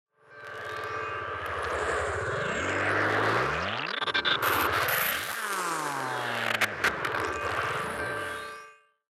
Movement; 99Sounds; InterSpace

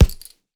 Punching Percussion, Straight Glove (Chain), raw

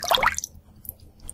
sink water; Foley; Kenney's Sound Pack

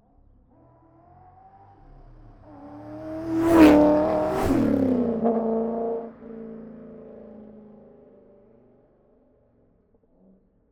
Corvette C5, Ext, By, Gearshifts, Wide; 2004; Pole Position

